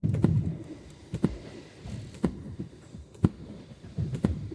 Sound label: click noise sample after